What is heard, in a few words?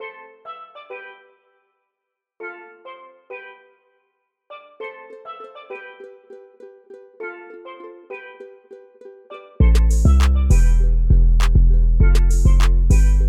islandy beat